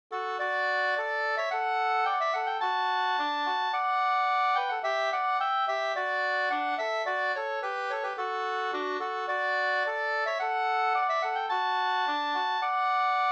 brudmarsch; e Per Johan Carlsson

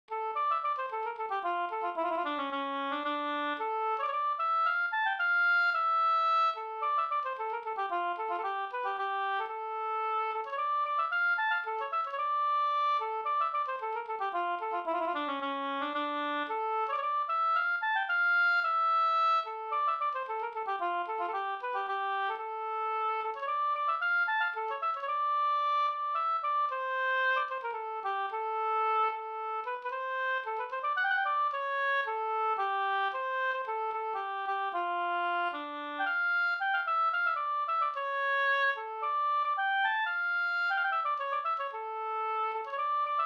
polska Bingsjopolska; Gratlaten e Pekkos Helmer

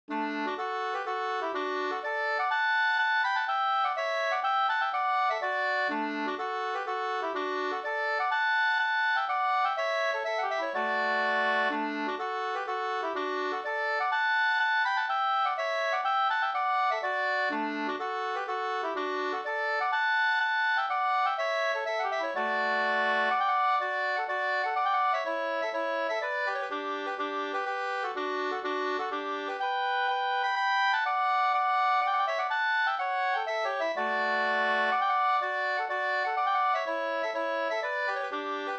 polska brudpolska; Adelso 2st

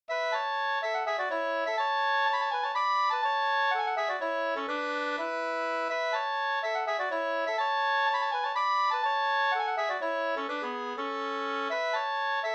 polska Bingsjopolska; Gladlaten 2st

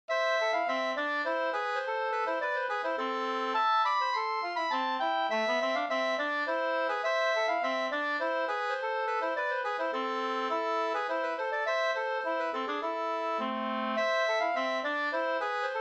slangpolska 2st

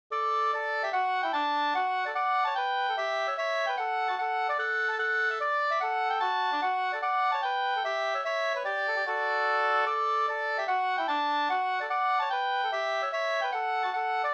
hambopolkett; Kajsas polska e Rickard Forsman Sd OA565 2st V1

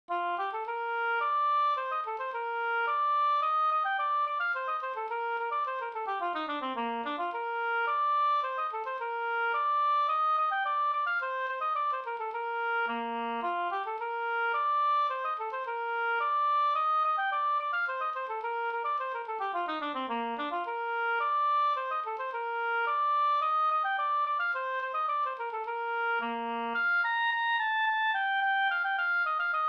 slängpolska

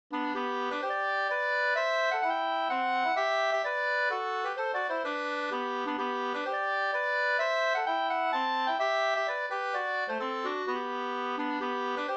hamburska; David Bastviken

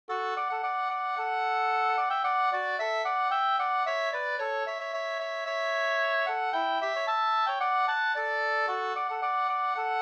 polka, DDGdur, 2st

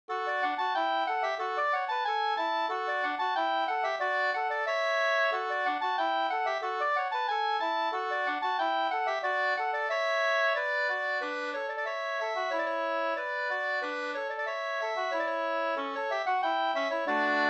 halling; e Lars Caspersson SvL Vr145 2st

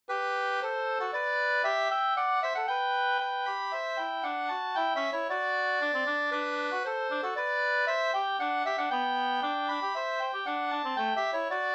polska, 2st; e Harry Hellstrom Breven

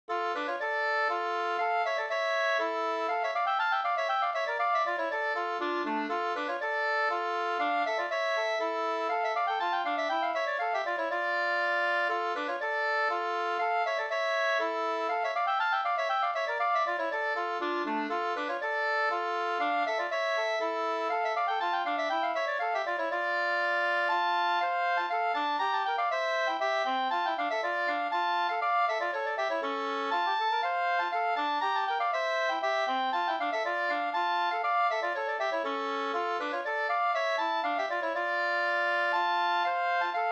polska d moll polska

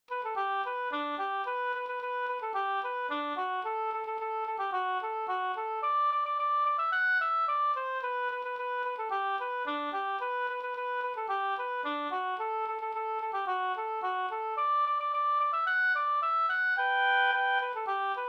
polka

